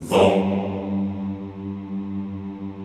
VOHMMM G1, Classical Choir; Best Service; Extended Classical Choir

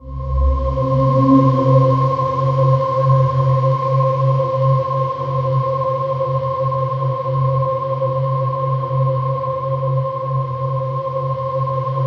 PAD, Dance Mega Synth; Best Service; 90 s Sample CDs

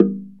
SLIT DR, AFRICAN PERC; Roland LA

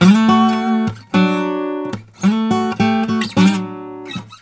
Blues Guitar; ILIO; Fretworks

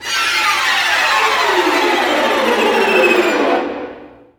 STRING GLISS; Roland - LA Composer vol. 1

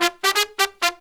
132, Pop & Funk Brass

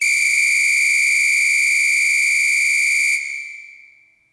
Samba Whistle L, Yamaha PSR E305

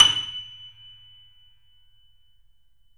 3D Pianos Yamaha C7; E-MU Producer Series Vol. 5

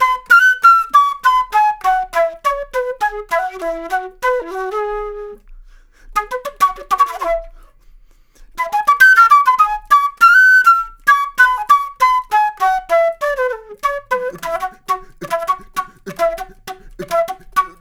Flute Solo, Jazz

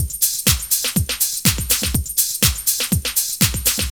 Drum Loops

